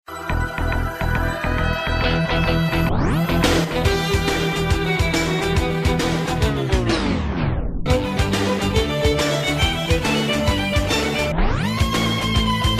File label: violin